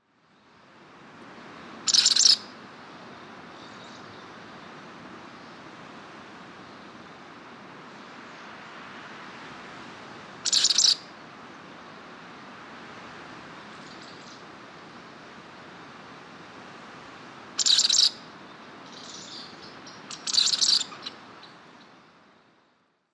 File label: Ancient Murrelet